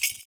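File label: PERC, percussions; Univers Sons - Raricussions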